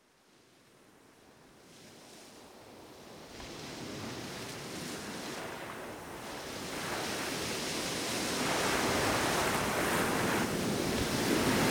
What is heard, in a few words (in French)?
Son des vagues de l'ocean en stereo